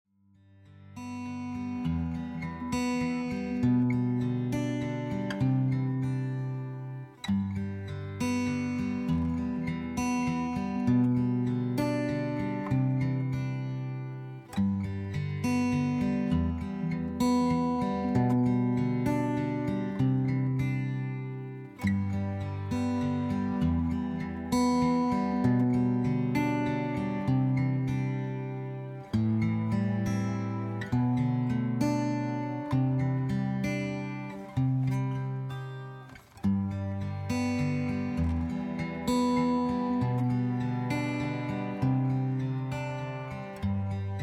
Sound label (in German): streicher